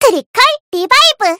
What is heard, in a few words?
battlecry; spyro